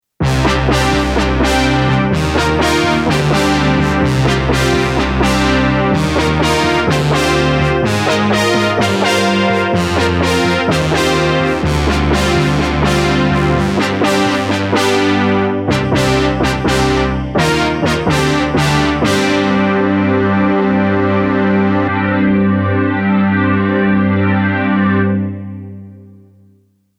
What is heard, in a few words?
brass